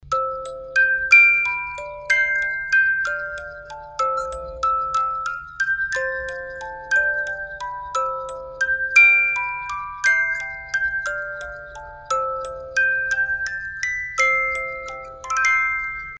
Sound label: English Country Garden, musicbox